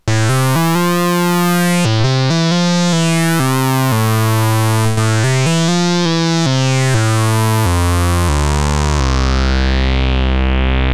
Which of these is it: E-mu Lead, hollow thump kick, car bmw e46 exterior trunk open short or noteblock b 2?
E-mu Lead